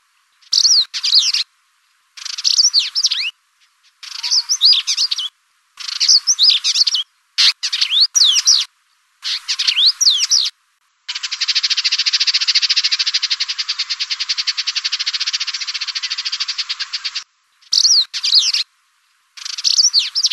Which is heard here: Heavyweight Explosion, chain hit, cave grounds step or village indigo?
village indigo